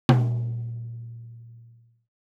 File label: Pearl Tom; hydrogen